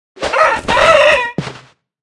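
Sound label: Ultra Chicken, 动作音效; 母鸡; 绿野世界; 卡通农场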